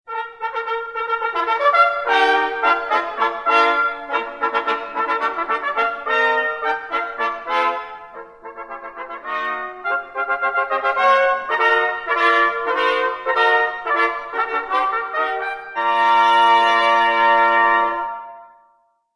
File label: Dignified Fanfare